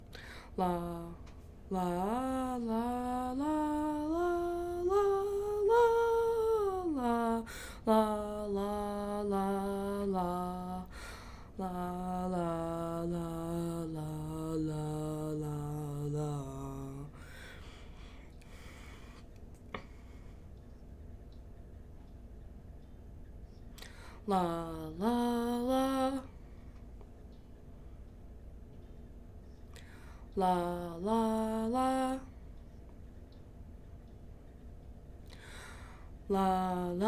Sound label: Baritone; allstate 2024; jrhigh; auditions